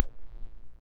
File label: when that diamond block, collect